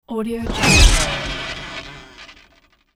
electrical blast sweetener; hollywoodedge

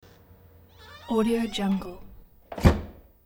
door close slam no latch; hollywoodedge

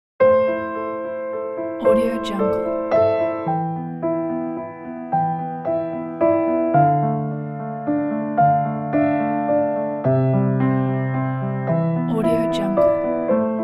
empty rain

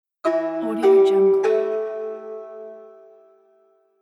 oriental interface

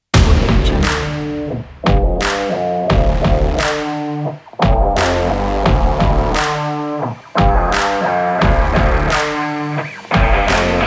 stylish sport indie rock

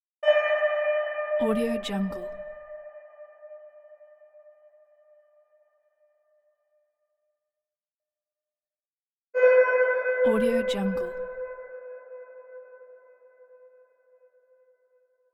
sonar; moonaudioproduction

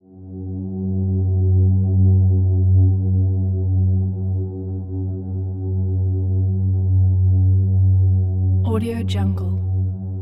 scifi ambient

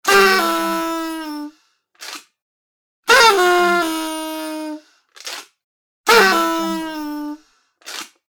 long party horn

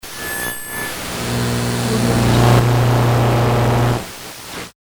static glitch

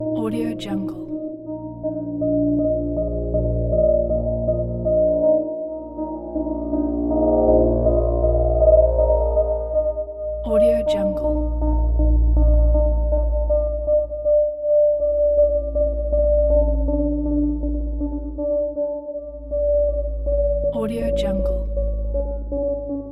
scifi pulsation

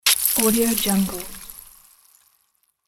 ice hit

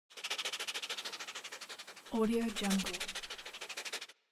fox panting; pure-noise

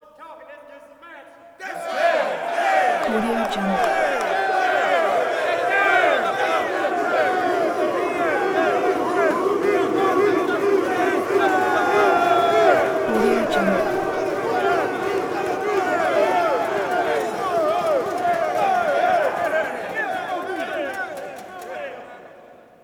prison crowd reverberant theater male inmates; prosoundfx

